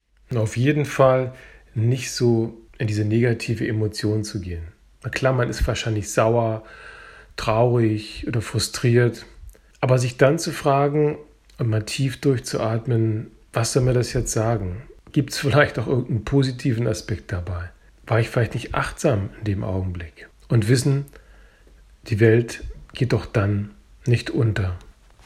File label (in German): floeten